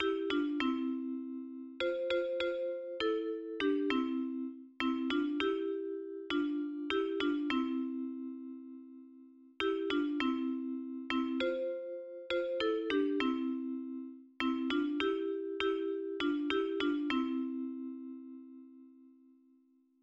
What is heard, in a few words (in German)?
Binamo eh C-Dur nur Melodie